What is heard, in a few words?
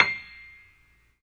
PNO Grand Pno; Club-50 - Foundations Roland